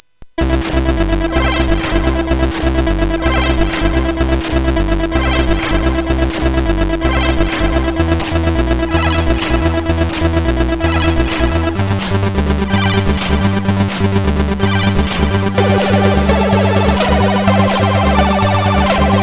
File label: Construction Kit, CSG8580R5; Ruelps Construction Kit In